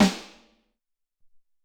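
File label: Big Snare, Alesis SR-18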